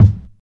fat kick sound g key, bass drum tuned to the G note. Loudest frequency: 126Hz; kick drum samples